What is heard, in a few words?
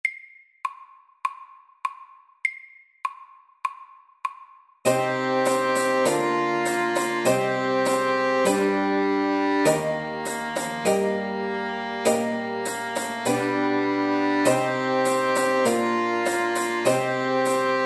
Old English dance medium 100